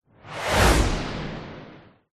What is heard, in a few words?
sweep transition fast whoosh airy